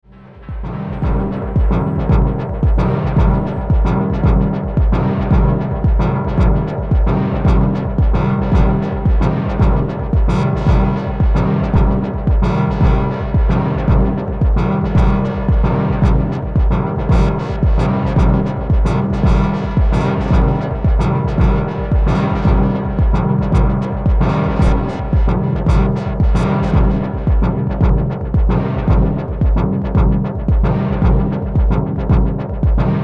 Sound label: Motor Synth MkII Dub Chords; Gamechanger Audio